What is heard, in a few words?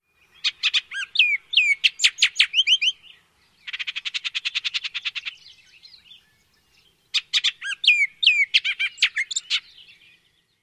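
song and chatter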